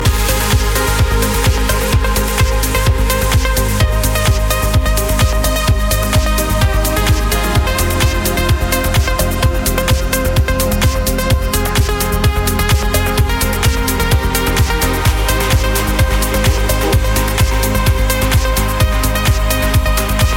trance

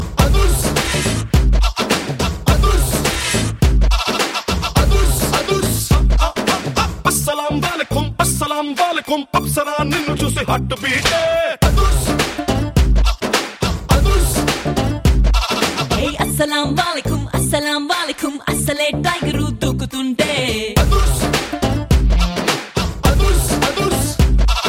main music; adhurs

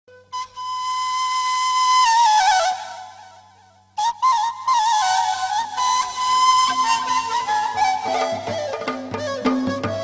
flute

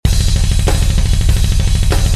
drums

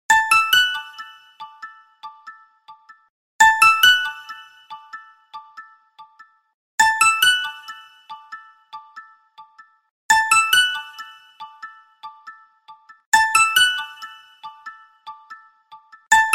classic clock alarm

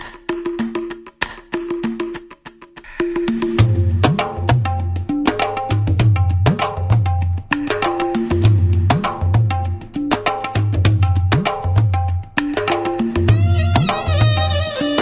classical

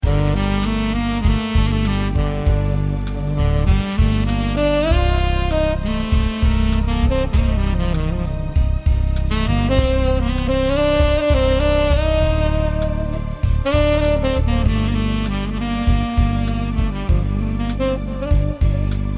romantic sax

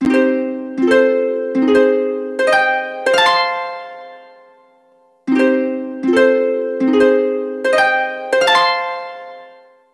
huawei p9 harp

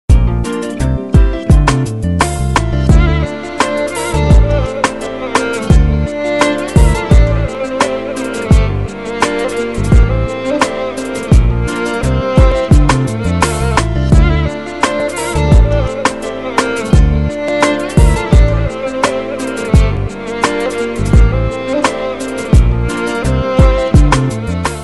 arabic music

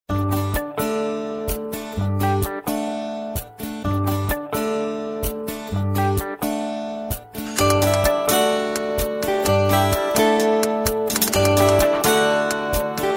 love guitar

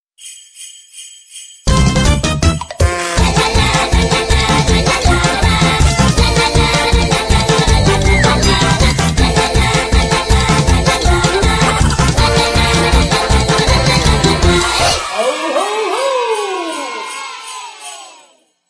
funny jingle bells

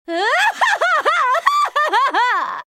laugh; league of legends; miss fortune

